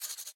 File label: Sketch Env Write; viewfinder; Maze